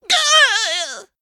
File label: Gnome Emote Death